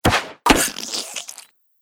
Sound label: Archery Arrow Hits Victim, bow and arrow